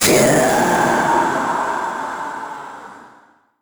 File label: trapdoor; Crypt of the NecroDancer